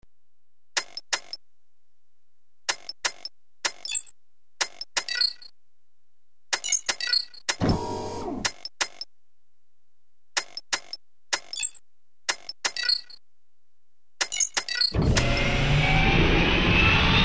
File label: robot